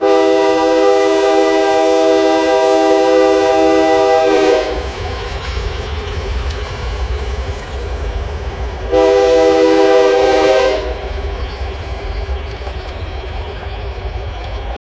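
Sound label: train steam whistle cartoon, intervals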